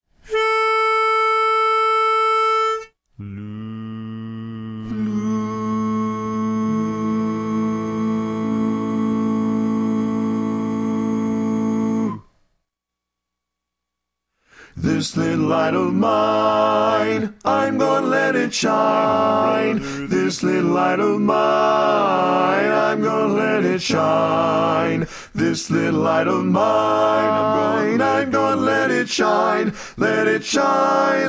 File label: This Little Light Lead; Do Lord